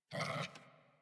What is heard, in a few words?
Puppy Idle Growl Playful; Warframe; Kubrow